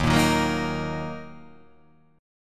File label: D sharp Minor, chord